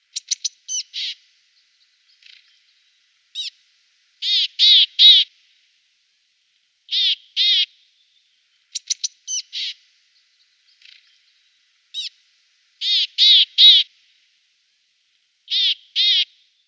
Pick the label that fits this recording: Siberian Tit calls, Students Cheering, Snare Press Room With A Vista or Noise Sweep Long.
Siberian Tit calls